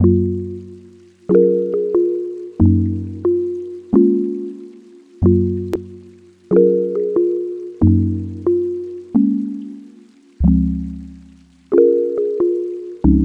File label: 92 BPM C# Maj, Lofi Melodies; Bubbles